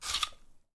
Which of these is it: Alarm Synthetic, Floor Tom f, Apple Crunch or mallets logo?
Apple Crunch